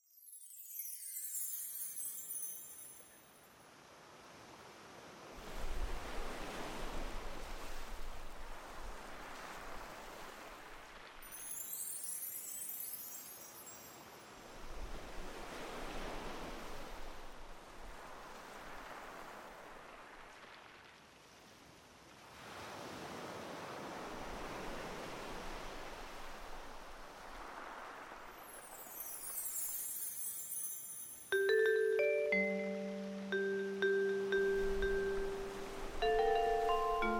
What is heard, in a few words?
Waves and Bells 4 Relax